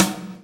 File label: Snares, Knocks